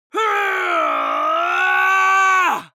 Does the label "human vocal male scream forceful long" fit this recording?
Yes